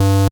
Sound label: bass